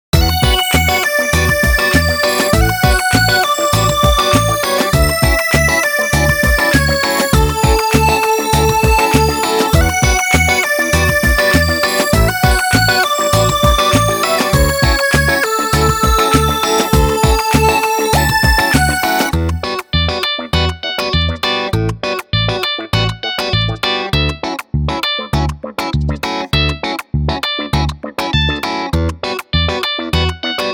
Trill, Bright POP

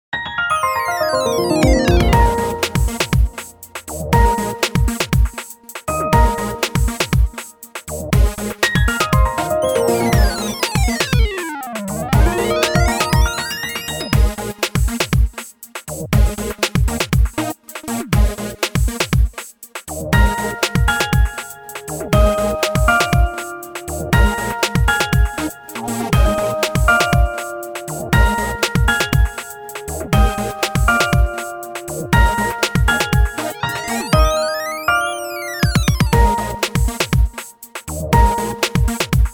Electronic, EDM; 리듬

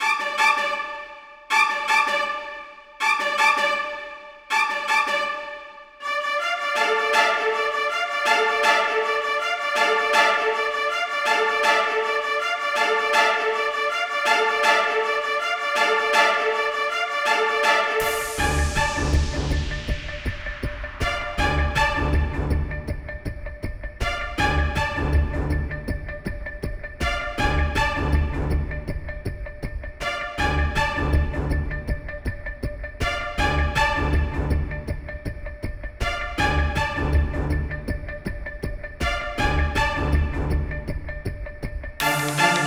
Witchhunting, Tension 공포

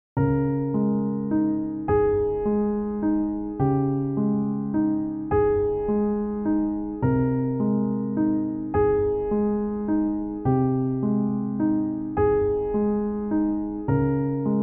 Newage, 감성

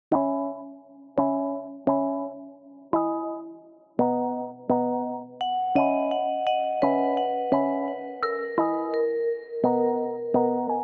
lo pop; 감성 POP

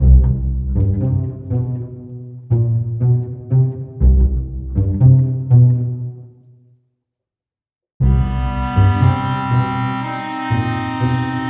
Pizz; Comic 드라마

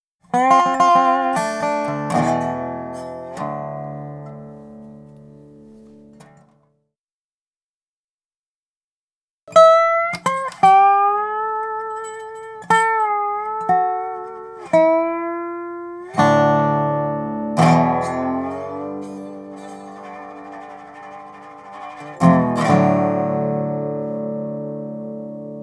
Dobro

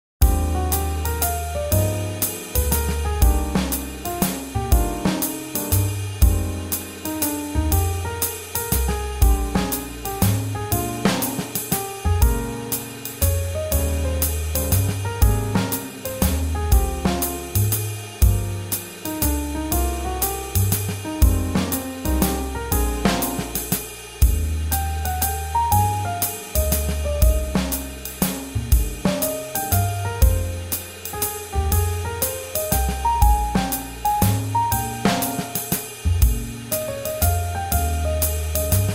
Swing Jazz

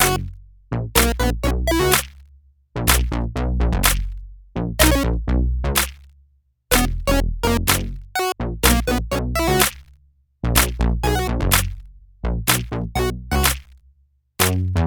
Tick Tac Chip; Comic 드라마

